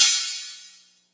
long crash cymbal sound sample f sharp key

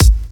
silky bass drum single hit g sharp key

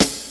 raw snare one shot F sharp key

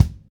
raw steel kick drum c key

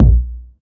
low kick drum F sharp key

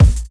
raw kick a key; kick drum samples; drum single hits one shots

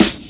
original snare one shot g sharp key; snare drum samples

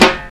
Strong Bottom End Steel Snare Drum Sample F# Key

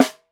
round snare single hit c key; tuned drums c key

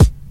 clear kick drum sample c sharp key; Channels Mono

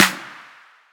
prominent snare sample f sharp key

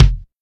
smooth kick drum sound F sharp key; kick drum samples

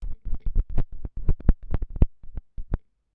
cleaning vinyl needle

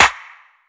wet clap one shot a sharp key; single hits one shots

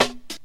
dark tone hihat B key; hat samples

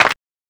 clap single shot g key; snare drum alternatives

